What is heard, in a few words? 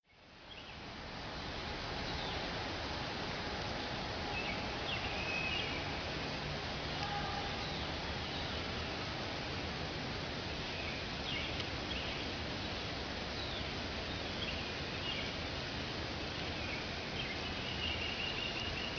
Forest Birds Amb